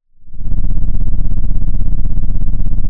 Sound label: Sound of an engine revving